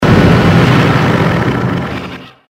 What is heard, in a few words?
scorpion stop; nod